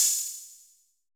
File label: cymbal, Roland MC-909